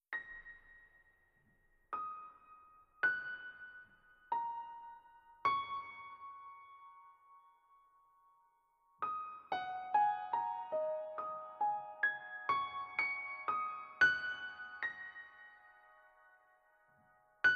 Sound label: PIANOLA